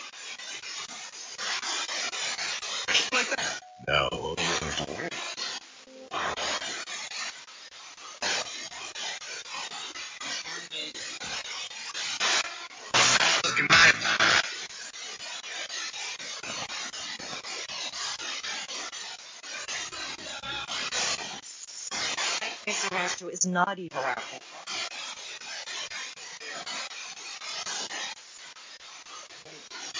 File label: 2nd Floor Landing; brookline private residence investigation